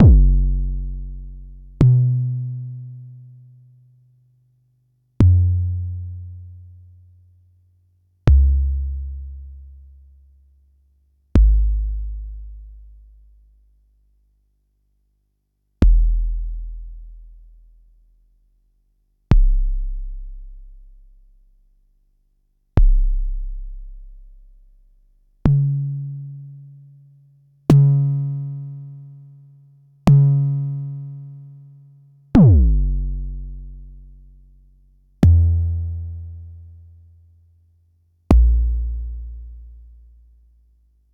Jomox Xbase09

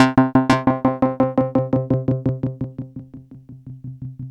GASSED C3, SYNTH; AMG; Global Trance Mission VOL-2

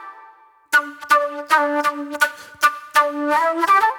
Spit Plate Flute

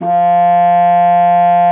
F3, bassoon; Xsample VOL-6

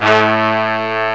HORN, BRASS; M8; CMI Factory

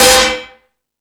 Rap Session, E-MU Pro-Cussion

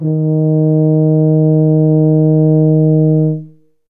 Tuba D#2; Best Service ProSamples vol.51; Classic Orchestra 2

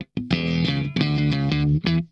SHOT, Funk Guitar; 90 s Sample CDs; Zero G

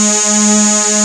Syn Reson A4; Best Service Gigapack 1

